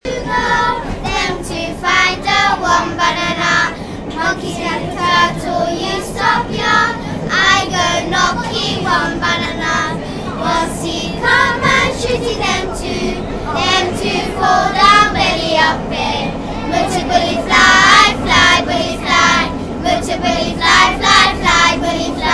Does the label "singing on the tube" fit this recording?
Yes